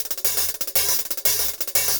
Top Ends; Zero-G; Monster Beats

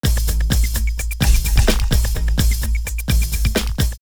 Rave drumloops 128; X-Static Goldmine; 90 s Sample CDs

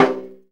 SNR RIM FLAM, VINTAGE FUNK; Zero-G; Funky Elements

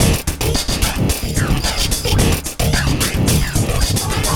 Loop; Sonic Foundry (Sony Creative Software); Esoterik Beatz; Cricket

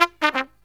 TRUMP, Killer Brass Riffs

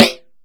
Snare OS; Rondo Brothers Trip-Hop Loops & Samples; Until We All Fall Down

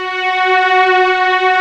Textural Strings

